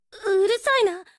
section fail; Kurisu Makise v2.0

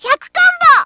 combo burst; Taiko AC0 v4

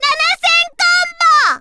combo burst; Taiko AC0 v4

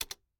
pause retry click; Kurisu Makise v2.0

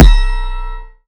heartbeat; [EZ] Ekoro blue

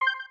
menu back click; BNHA V.2

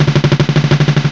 normal slider slide; Taiko AC0 v4

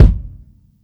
soft hit whistle; muni muni! v1.1

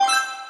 menu hit; Obsidian

